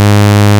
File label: JX SAW; INIS; Vintage